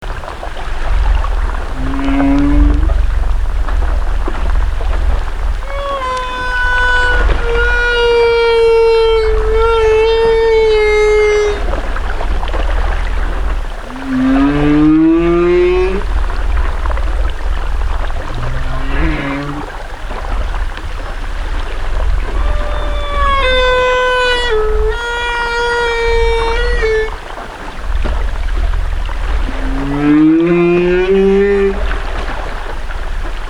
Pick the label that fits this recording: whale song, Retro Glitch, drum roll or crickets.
whale song